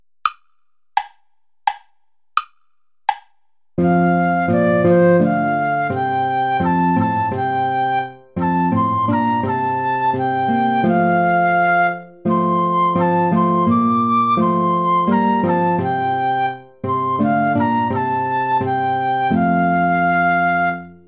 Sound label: lord sing with lips and heart play along without guitar